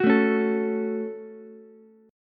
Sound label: F sharp Minor, Ukulele; strummed